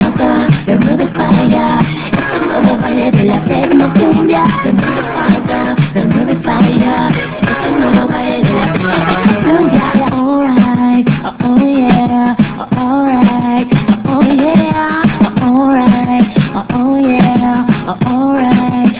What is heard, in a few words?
techno cumbia